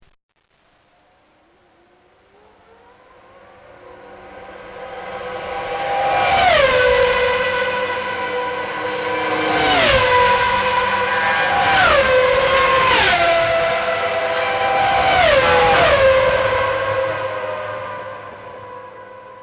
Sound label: six f1 cars